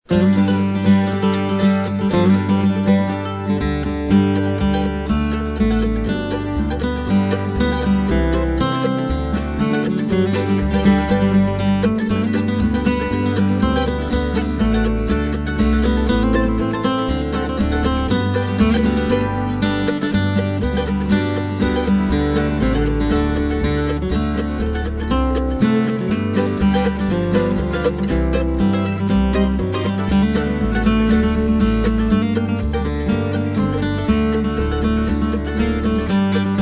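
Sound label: French National Anthem Late; Sid Meier's Railroads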